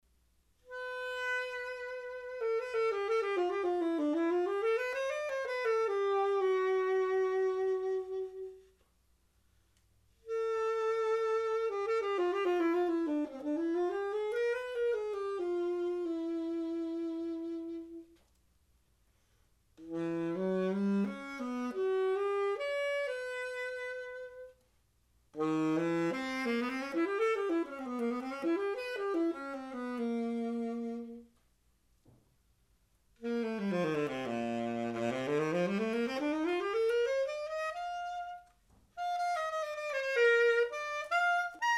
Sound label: c tenor